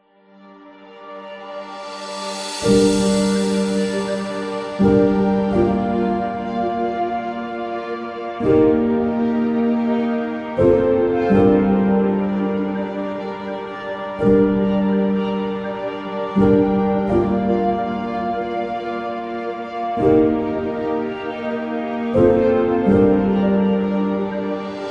Documentary Nature, Epic cinematic background music